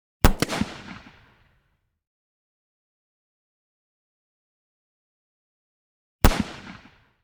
.357 Magnum Pistol Blasts Medium Range; Hollywood Edge; Heavily Armed

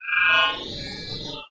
Alien Wierdness, Sci Fi; 1001 Sound Effects

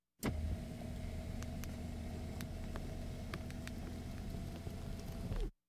Automobiles various

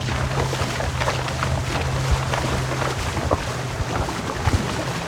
Auto Tires Mud Loop; Automobiles

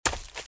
Footsteps - Leaves - Run - Left; RadwinIsland

